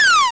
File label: Laser